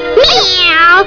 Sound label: CAT, 猫; 动物; 家畜; 特酷声音素材库